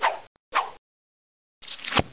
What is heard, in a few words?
Cheetah